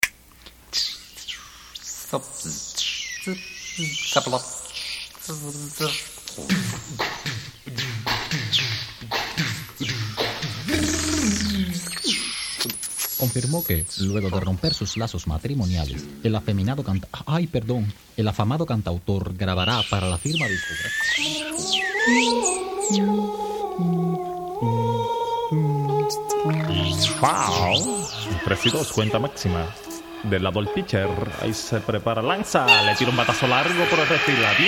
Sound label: Radio Tuning Acappella